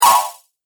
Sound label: Ice Shard Spell, Magic Sound Effects